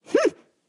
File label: reaction hm; goldentavern